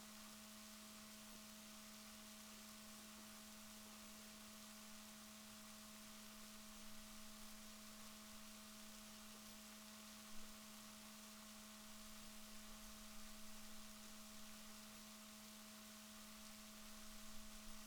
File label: rorquals negatif, Balaenoptera Physalus Chile